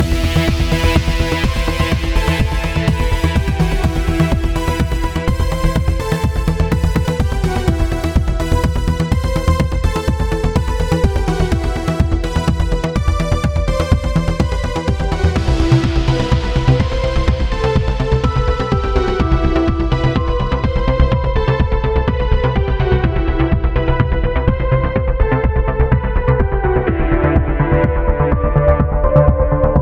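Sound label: Fight 4x4 D major; Challenger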